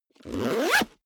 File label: zipper backpack close accelerate